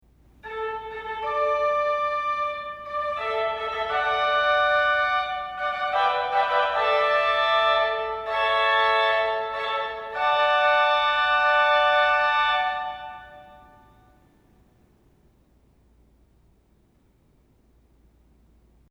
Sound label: Fanfare